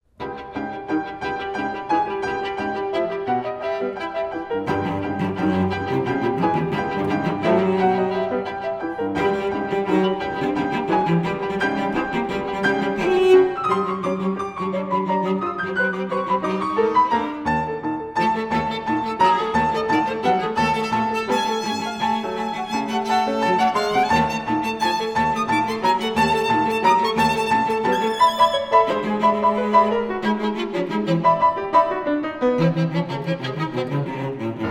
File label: Piano Trio